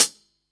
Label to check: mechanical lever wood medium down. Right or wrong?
Wrong